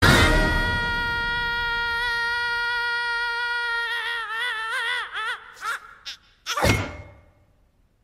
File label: Paaaaaaaaaa